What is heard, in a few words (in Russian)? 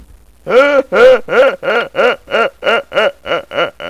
smeh